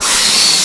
Leak; Toy Story Mania; iOS gamerip

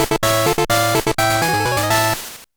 Fanfare - Card Flip

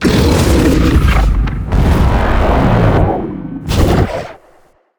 Beam; helltaker

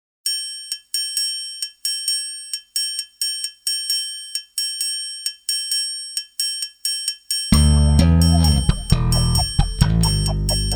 Suspense; Dancing Blade Katteni Momotenshi 2 Tears of Eden

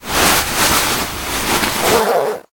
Prisoner Clothes On

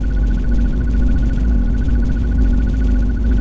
energy force spell loop

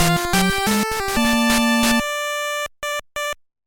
Victory Jingle; 7 Horizons

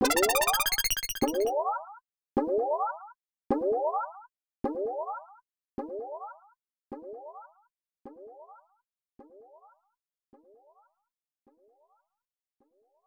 Coin; Earth Defence Force

